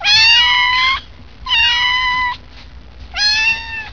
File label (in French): miaulement chaton